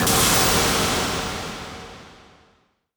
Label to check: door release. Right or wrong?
Right